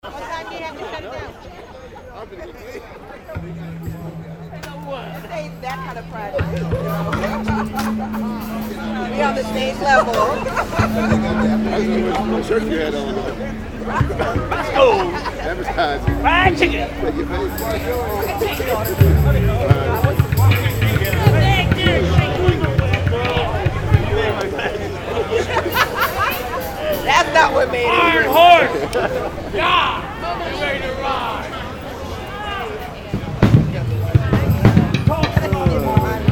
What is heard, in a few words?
BACKGROUND NOISE